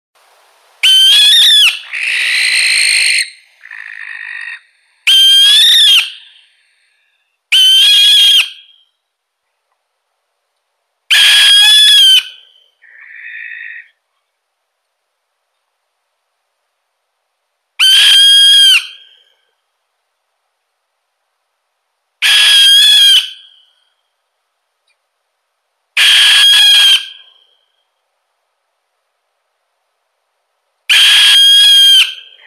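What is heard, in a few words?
barn owl, Tyto alba; sipuha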